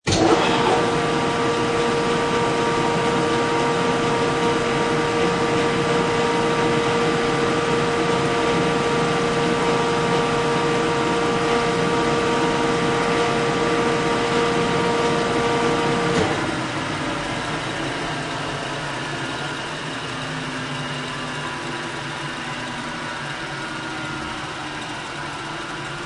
Grinder, Industrial grinder, start up, run, long wind down; Grinder type 1; Grinder Sound Effects